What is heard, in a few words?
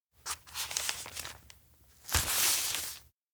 map trace point; awayuki; anemoi パッケージ版